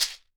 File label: MARACAS; EdgeSounds; Drum Mashines VOL-1